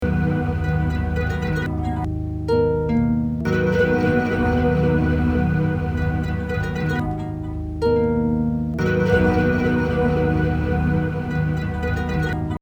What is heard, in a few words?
LOOPING Punch In